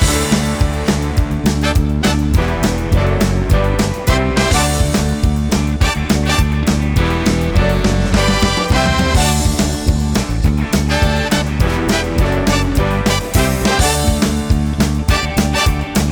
Attract Music; wheel of fortune

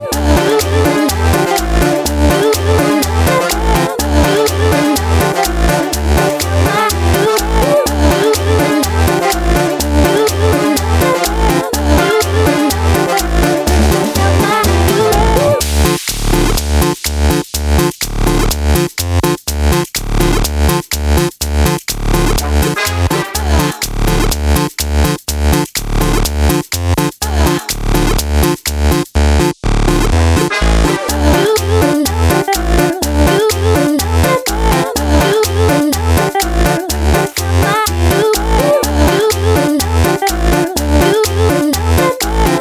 Chorus 96 beats

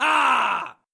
Crowd cheer

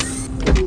Fsdoor

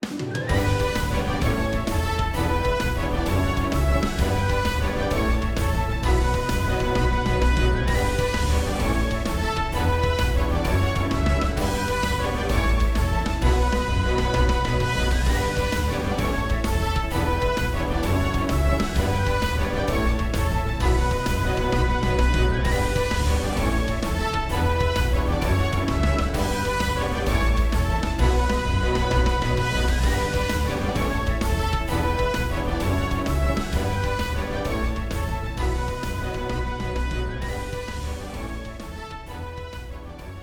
Winning; Capcom Fighting Collection 2; PS4, Switch, Windows, Xbox One

